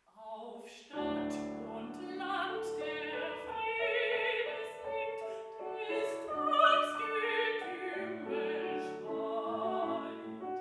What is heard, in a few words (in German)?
Abendlied